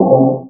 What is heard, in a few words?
ohit mono 1920hz; tic80 pcmtest